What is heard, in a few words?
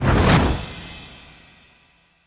super fireball; Kung Pow